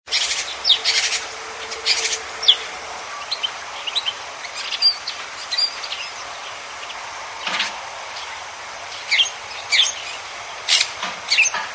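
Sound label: lesser grey shrike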